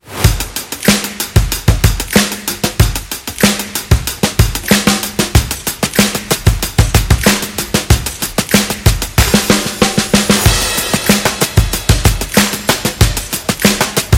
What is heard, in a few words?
Drum Beat RnB; calm drums